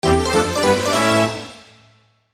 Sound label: Key Item Get!; paper mario the thousand year door